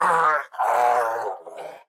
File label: Prisoner Death; Folk Hero